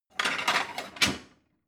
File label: plate multiple shelve